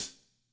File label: HiHat Closed Oneshot, drums